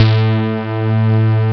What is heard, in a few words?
mt32 syn bass; Amiga Soundtracker Sample Packs